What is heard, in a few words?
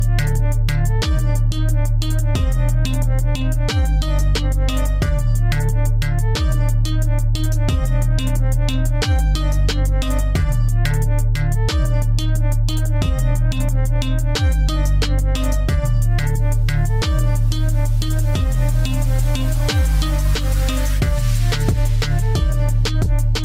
Galaxy Y Pro Duos Ringtone